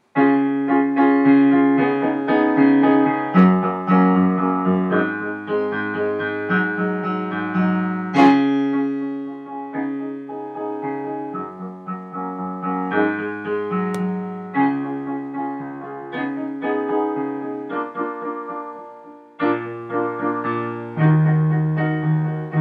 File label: accomp; dhs greaseauditions girls